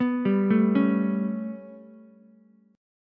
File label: B 7th Suspended 2nd Sharp 5th down Ukulele, chord; B7sus2#5; arpeggiated; JGuitar